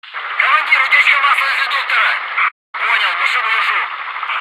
damage, helicopter; vehicles

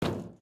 tin, step

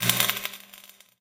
tele damage, poltergeist

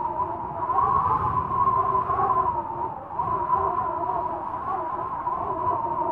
whistling wind

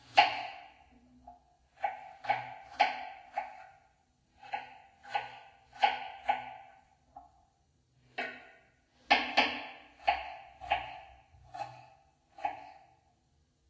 kitchen, bar ambient

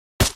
bullet hit

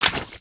water, human step

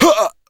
pain

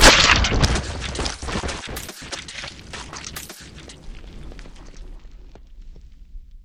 anomaly body tear

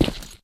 human step

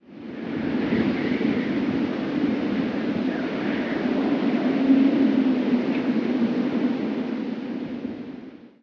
wind, nature

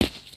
asphalt short, human step

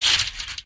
human step

